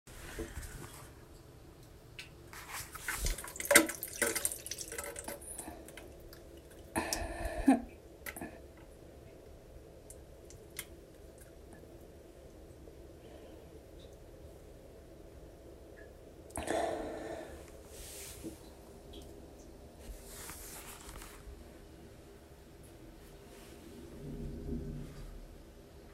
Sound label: toilet